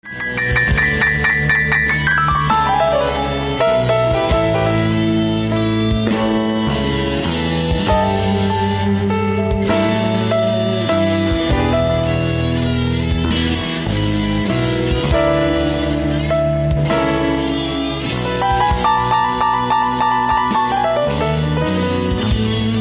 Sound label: Royal Blues